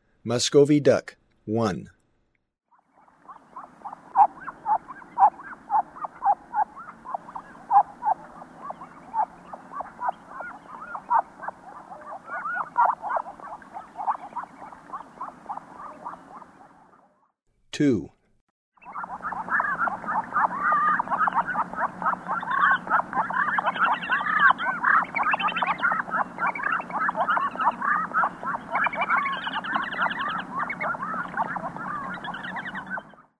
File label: Muscovy Duck; S. America; Eastern Lowlands